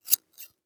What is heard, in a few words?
Scissors Cut Hair, Household